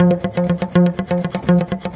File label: strum one note; GUITAR LOOPS